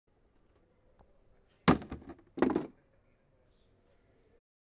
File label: Box Falling; Zoom